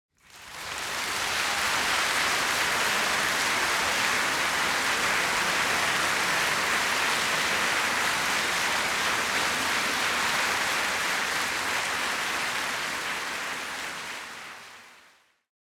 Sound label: Applause - Large Crowd - Shorter Interior Music Hall Orchestra; Hollywood Edge; sound designer's toolkit v2